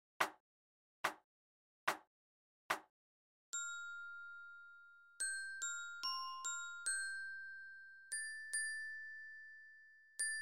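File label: Lyra, 72 bpm; Edinburgh Tattoo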